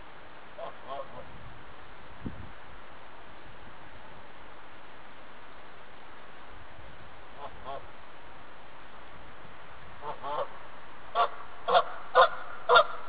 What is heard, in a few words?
canada geese